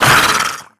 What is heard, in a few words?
attack warning, headcrab, creatures; headcrab classic; vox